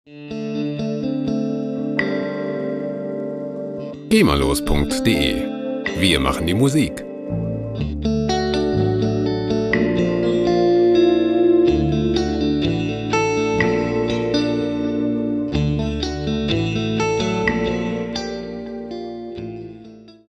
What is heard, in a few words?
guitar magic